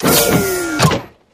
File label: Defense Grid Door, pneumatic